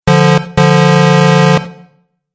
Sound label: Truck Horn Two Blasts; Truck Sound Effects; vehicle